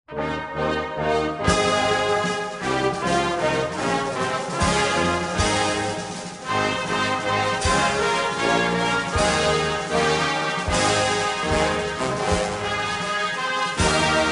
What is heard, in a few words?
Kin Folc